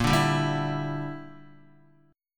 A sharp 6th Flat 5th chord, Guitar; A#6b5 strummed; JGuitar

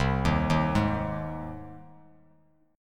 C Minor Sharp 5th C down Bass Standard; arpeggiated; JGuitar

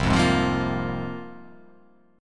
C 7th Suspended 4th Sharp 5th, C7sus4#5 chord; C7sus4#5 strummed

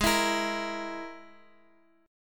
Ab Suspended 2nd Sharp 5th Ab, Guitar Open G; x,13,14,15,x,x; down

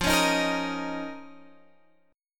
Eb 7th Suspended 2nd F

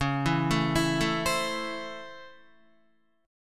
Db Minor Major 7th Sharp 5th Db Guitar; DbmM7#5 arpeggiated; JGuitar

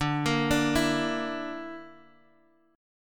D Suspended 2nd Sharp 5th D Guitar Open F; x,9,10,9,7,x; arpeggiated

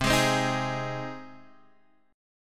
C Minor Sharp 5th C down Guitar Half Step Down

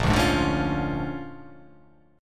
A sharp 7th Sharp 9th

